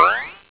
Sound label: spring; Tanks